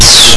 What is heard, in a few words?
zap; DragonMaze™ v2.7 Demo